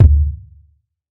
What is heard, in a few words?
deep kickdrum d key